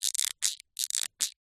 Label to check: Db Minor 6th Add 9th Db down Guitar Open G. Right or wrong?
Wrong